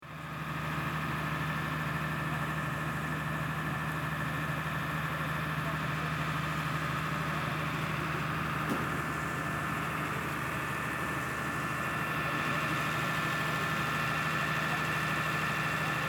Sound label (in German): Silvester Feuerwehr